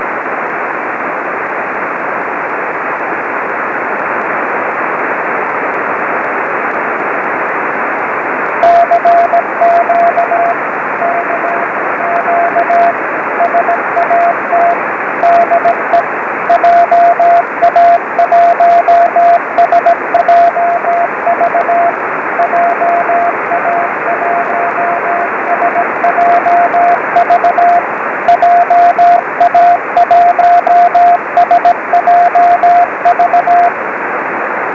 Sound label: ao40 cw qso